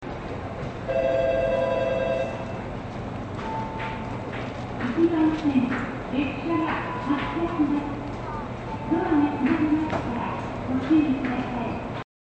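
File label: kemigawahama, departure bell; keiyo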